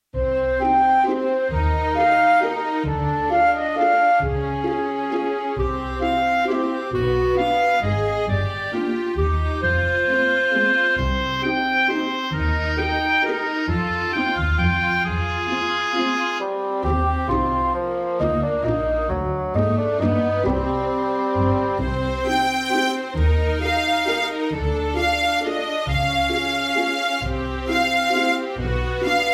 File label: waltz